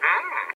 "Hmm"

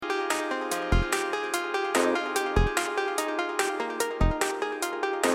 Mixer Sms

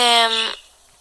emmm

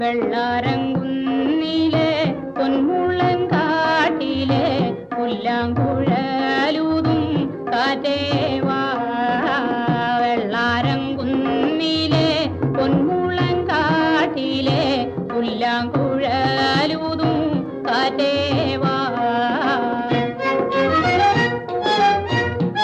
Drama Songs; Kpac

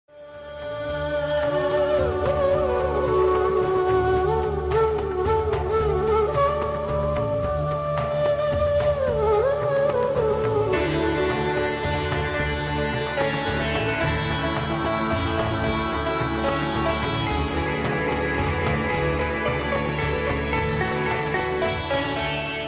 Soft Flute

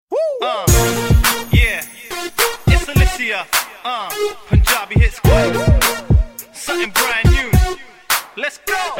Super Mix Hard Bass

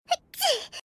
Sneeze; Chika

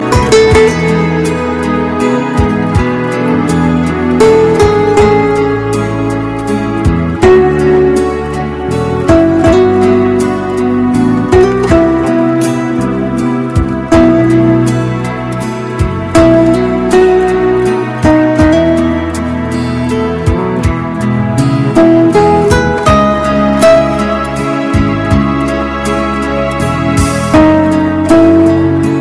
Very Slow Guitar

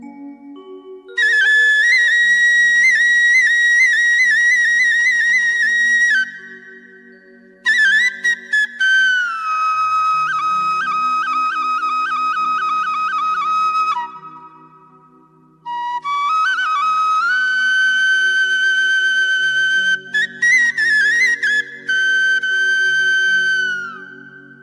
Basuri; Krishna

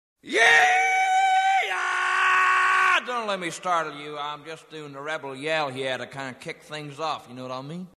Yeehaa

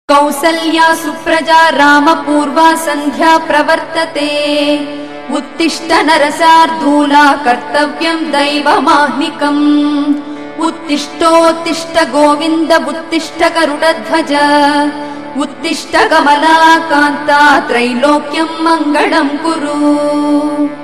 Suprabhatam

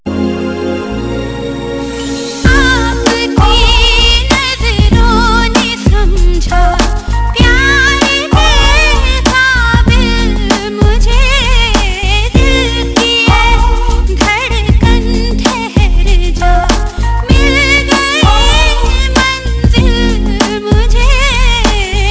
Bollywood Song

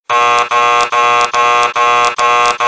Nokia Alarm Clock

